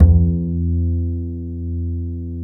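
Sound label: DB F2, double bass; Balkanish Traditions; USB Soundscan vol.63; AKAI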